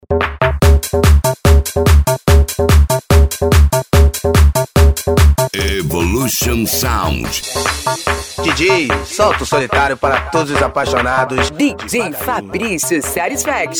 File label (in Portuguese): esp de pancada